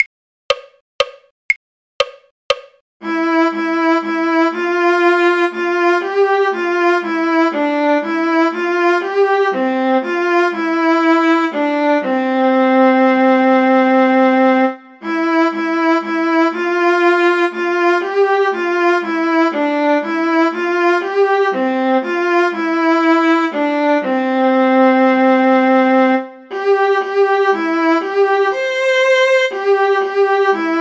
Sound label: drink to me only with thine eyes viola; folk